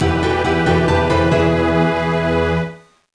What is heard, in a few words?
Unknown Jingle; Golden Sun: The Lost Age